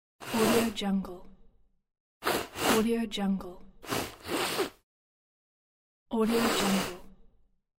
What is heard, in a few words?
sniffing nose